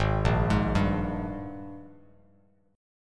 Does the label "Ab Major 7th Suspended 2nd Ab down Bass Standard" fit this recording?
Yes